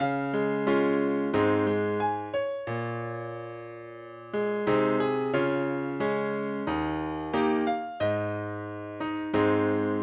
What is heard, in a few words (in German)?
Klavier Gesang integriert